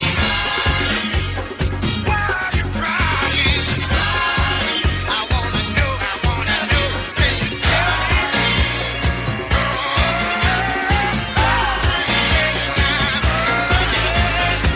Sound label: crying; salsoul; disco; discotheque